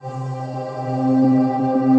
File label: Soft Magic Pad; Ethereal Pads; Ableton; Mood Reel